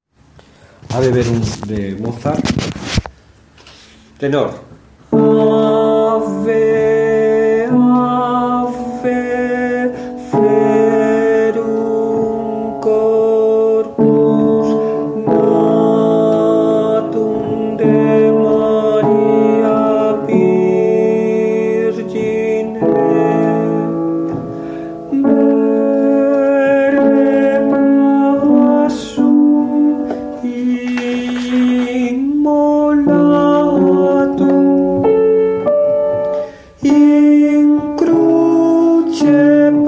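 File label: tenor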